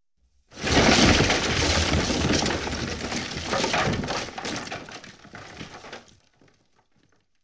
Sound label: Explosion; Explosions - 5.1 Surround